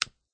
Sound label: light click